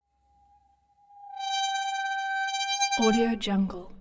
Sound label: object metal squeal short tonal; smartsoundfx